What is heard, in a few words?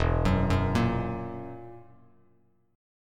E Suspended 2nd Flat 5th E down Bass Standard